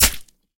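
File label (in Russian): точное попадание в цель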